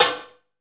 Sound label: SD, Drum & Bass, 160 BPM